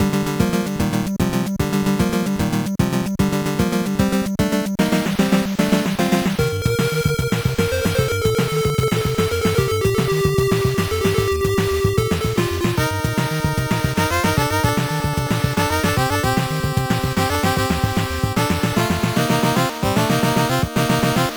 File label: Stage 2 BGM